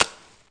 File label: Light Bomb; Ruckus